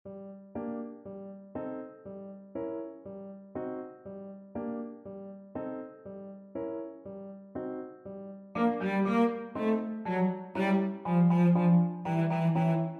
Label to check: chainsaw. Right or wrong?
Wrong